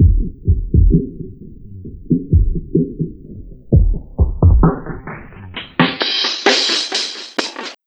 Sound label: FILTERS, Dark Side Of TripHop